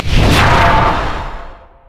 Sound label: slash; LoL; tryndamere